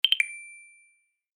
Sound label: notification